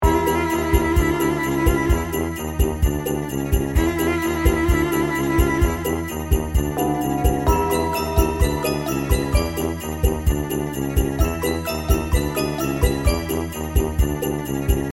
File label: Alarm Theme; Polar Panic